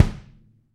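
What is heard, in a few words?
bass drum, BD Real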